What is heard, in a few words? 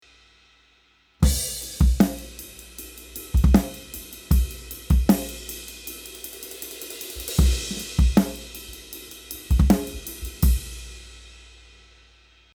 Section B Kick and Snare